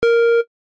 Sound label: bip